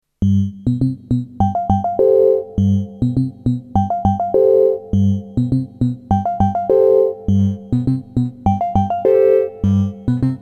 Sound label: Liven 8bit FM engine